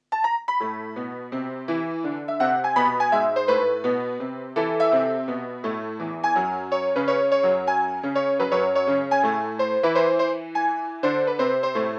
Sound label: happy street blues; vinyl